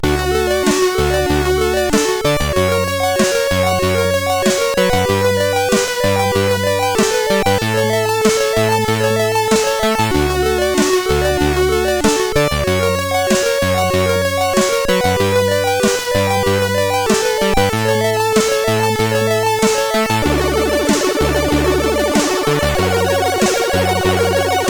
Sad Tune, 8580

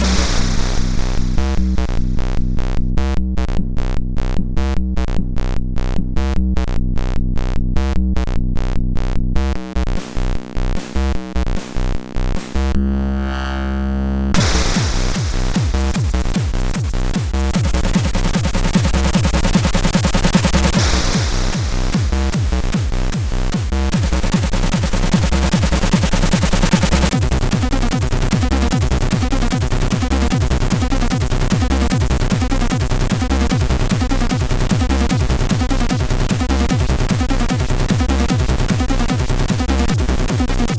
303 plus 808 plus 303, MOS6581R4

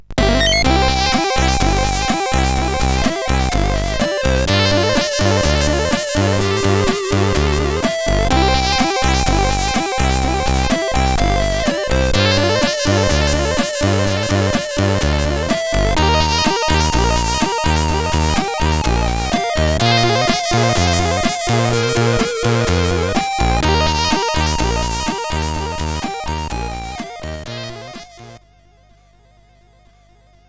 Bossa, sid CSG8580R5

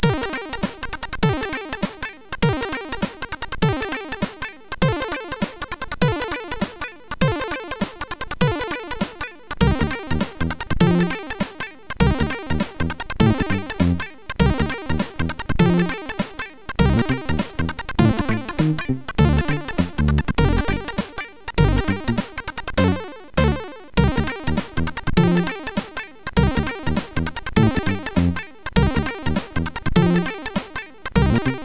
sid MOS6581R4; Strange

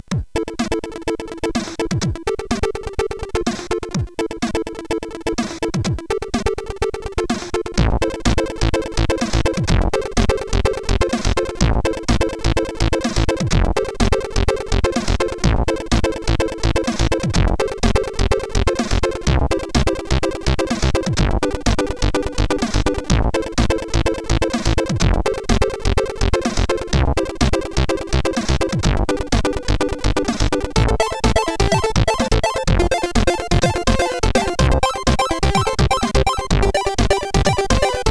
Crackintro, CSG8580R5; hvsc

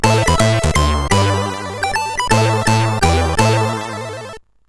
Jingles, sid CSG8580R5

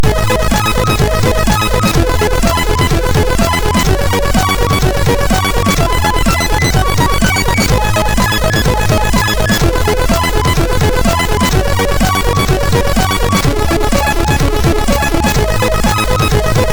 Dance 2, sid MOS6581R3

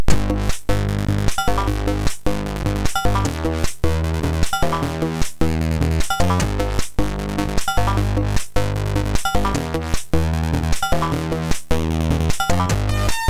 Lil Bass, MOS6581R3; sid

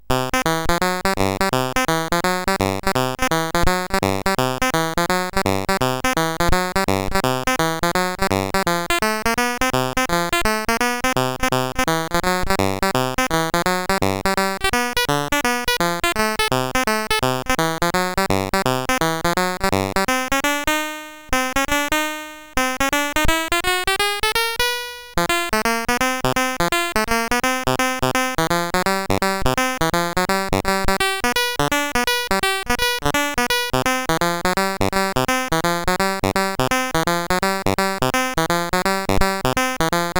Chromatic Boogie BASIC, CSG8580R5

